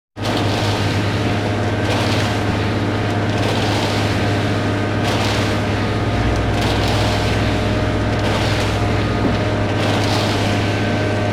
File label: Cement Truck; KMP Film Video Sound Effects